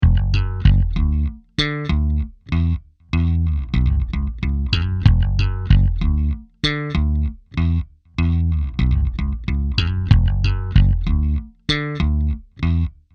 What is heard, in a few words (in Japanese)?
ベース スラップ なし